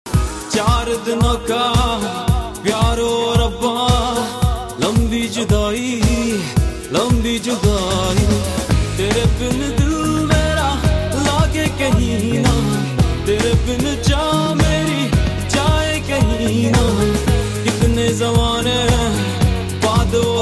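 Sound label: bollywood sad ringtone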